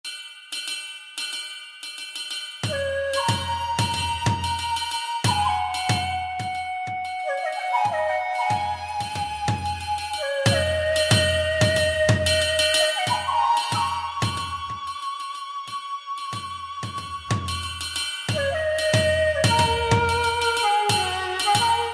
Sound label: Korean Palace; Lost Saga